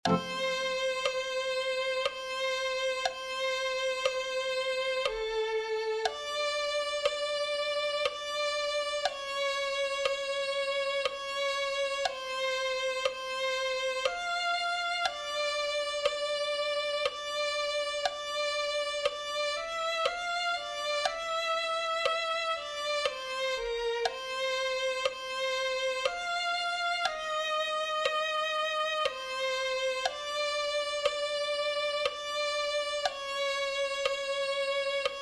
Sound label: violin 1